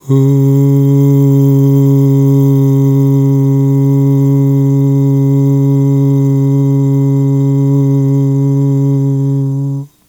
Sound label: AHHH, Vocal; Polyend